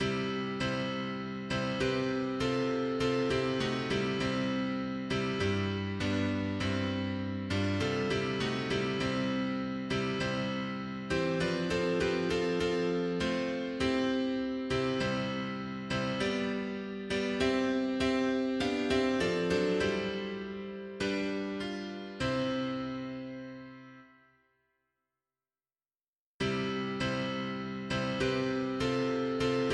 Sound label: mono piano; hymns; Himnario grande